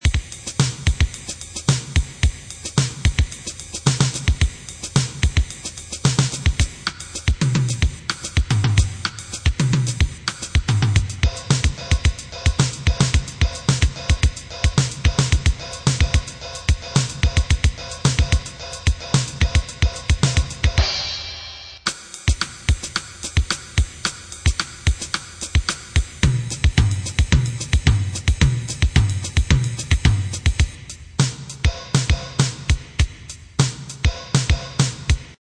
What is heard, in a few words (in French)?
Technique binaire, batterie; Cours de batterie; Exercices